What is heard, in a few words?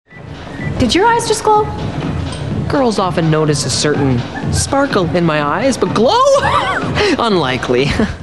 sparkle